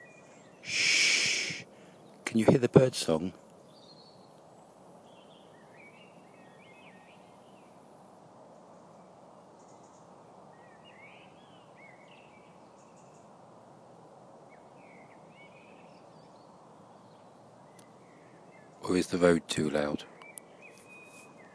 birdsong

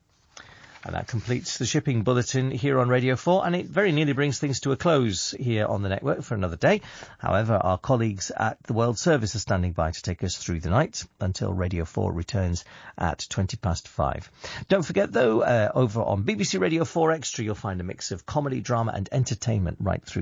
seven pips

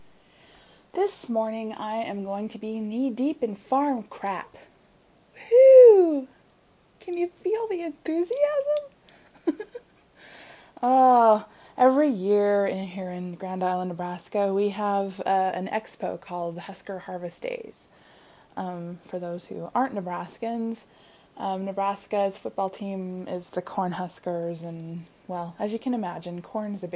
yeee haaawww

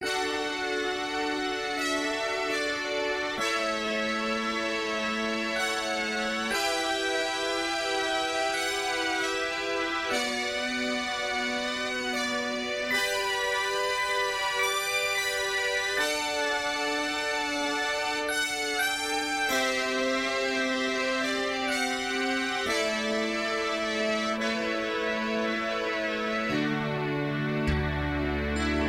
vintage tape effect on synthesizer

